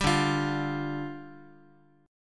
D Minor Double Flat 5th, down, Guitar; Dmbb5; strummed; JGuitar

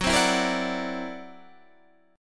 C 9th Flat 5th F sharp

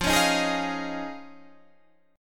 Eb 7th Suspended 2nd Suspended 4th

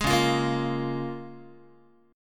C sharp 6th C sharp down Guitar; strummed; C#6; JGuitar

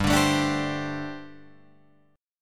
G Suspended 2nd Suspended 4th G, Guitar, Drop D

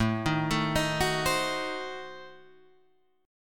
A Diminished 7th A, chord shape; Adim7 Chord {5 6 7 8 7 8} arpeggiated

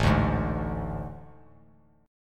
A Major 7th Suspended 4th; AM7sus4; strummed; JGuitar

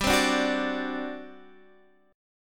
G Major 7th Suspended 4th, Guitar, Drop D; x,10,10,7,7,10; down

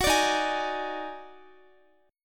Eb Minor Major 11th, down, Guitar; strummed; 4F,4A,5C,5G,5C,6E; chord; JGuitar

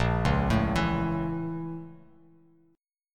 Bb Minor Major 7th Flat 5th, Bass Standard; BbmM7b5; arpeggiated; 6,4,7,9; JGuitar

